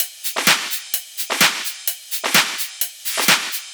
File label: EDM Top 128 bpm, Drums; Loops; Ableton Live 12 Lite; Core Library